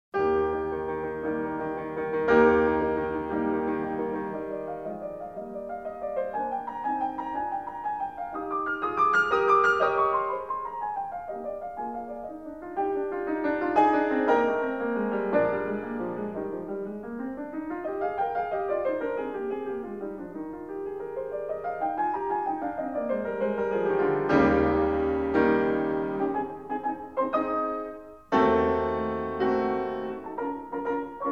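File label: Piano Sonata No. 4 in E flat major; Wilhelm Kempff; Beethoven; Piano Sonatas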